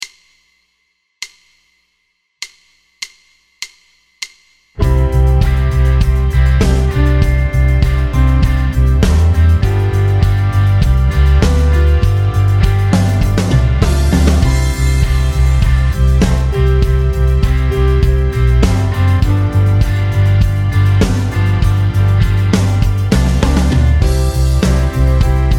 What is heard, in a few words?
One Five One C2 100 GTR